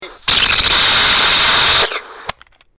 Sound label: rewind